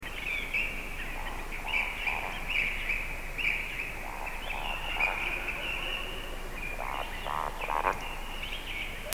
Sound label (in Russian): valdshnep brachny polet, birds